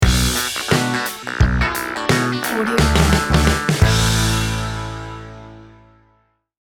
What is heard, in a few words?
sitcom funk logo